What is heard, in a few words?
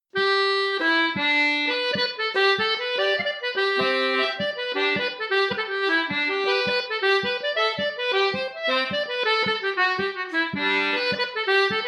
Jig on CG Equal Temperament